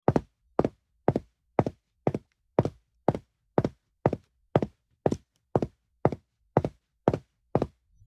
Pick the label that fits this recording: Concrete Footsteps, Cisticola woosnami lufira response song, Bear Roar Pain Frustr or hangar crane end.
Concrete Footsteps